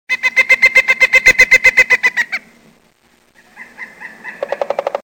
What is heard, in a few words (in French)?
Oiseau